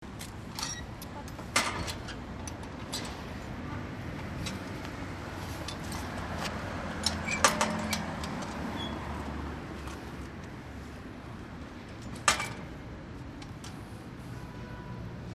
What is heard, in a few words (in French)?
le portillon